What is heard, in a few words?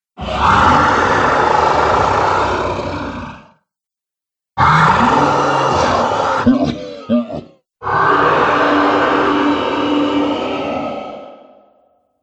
Three Dragons, monster; horror